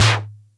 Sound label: Music Percussion Computer Tom, Drum Machines